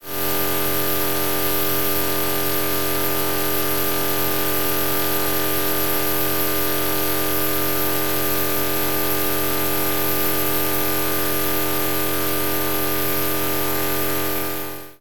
Tone, Buzz, Noise, Steady, Buzz, Coarse, Harsh with Noise, Thin, High; Airborne Sound; Transmission - Interference